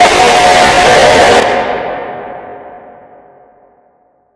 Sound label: robotic life jumpscare sound